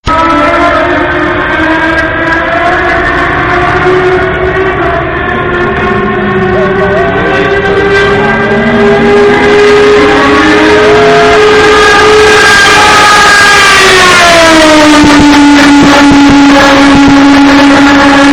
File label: car sounds effects